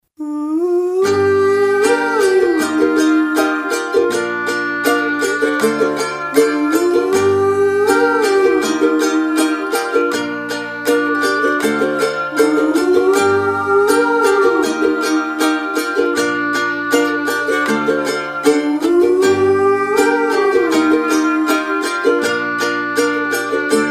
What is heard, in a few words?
Ringtone